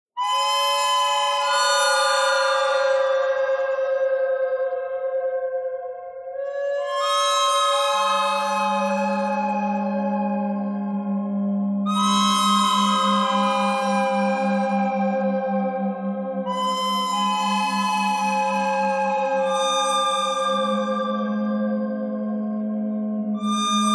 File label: bird thread pentatonic; noreverb